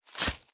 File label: cut rope